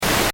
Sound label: Static Blast SciFi Data Transmission; Static Blast Data Transmission Scifi Technology